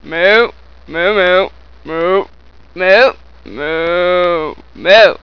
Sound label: space moo; hall of moos